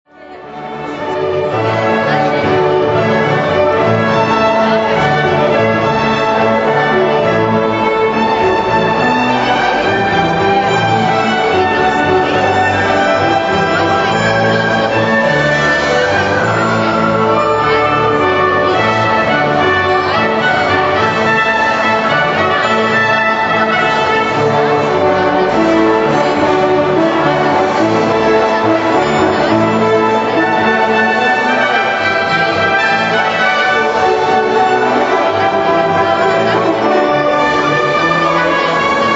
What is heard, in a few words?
alla horn pipe